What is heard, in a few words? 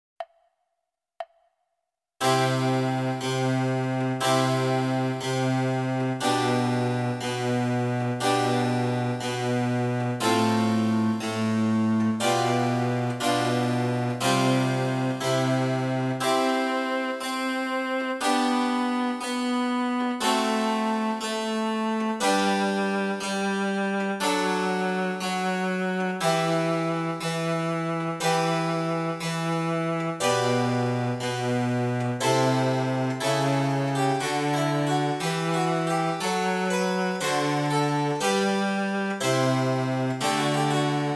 oboe sonata a-moll; telemann